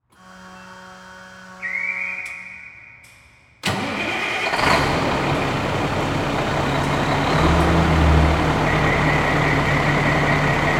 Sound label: Truck Fire Truck Start Up Shut Off Interior Fire Station Garage; transportation